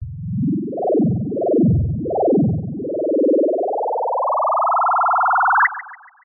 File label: synth rocket clock robot wobble power up long